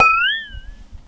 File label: bawl